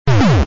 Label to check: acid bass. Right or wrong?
Wrong